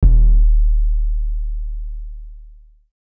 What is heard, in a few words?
Kick